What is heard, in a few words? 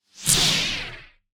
lightsword turning on; soundscrate